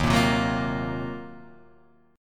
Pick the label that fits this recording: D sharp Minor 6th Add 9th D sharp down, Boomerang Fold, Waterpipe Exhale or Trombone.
D sharp Minor 6th Add 9th D sharp down